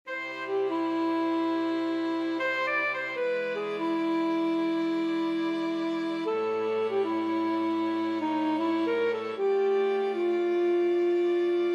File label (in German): statisch Streicher